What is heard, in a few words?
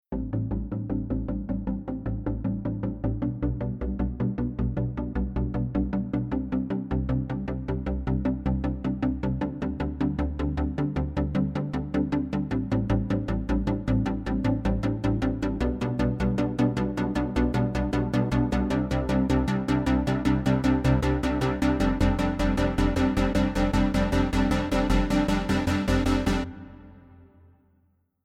FutureBass Mix Chord Pluck EQ Off